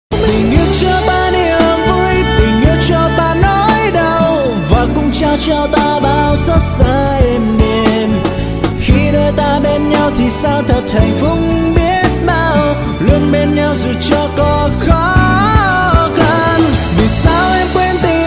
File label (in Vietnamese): khoc